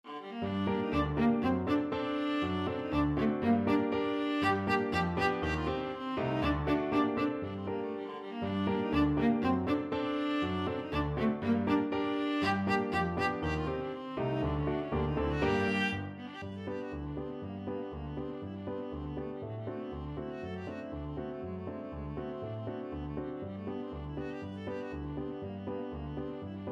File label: polka-italienne, viola